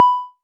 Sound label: Multi-Bell